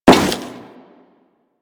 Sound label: Crewman Step Default, Footsteps; Warframe; Corpus